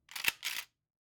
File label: Shotgun Racking; SoundsCrate